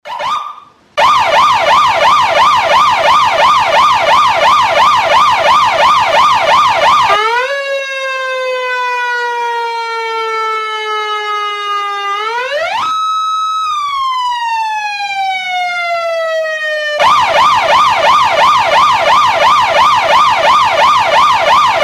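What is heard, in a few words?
siren ambulance ringtone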